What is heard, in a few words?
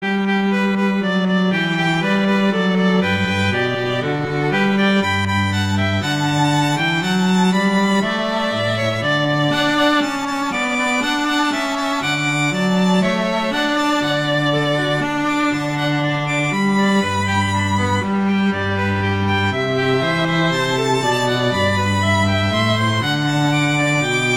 Allegro; Corelli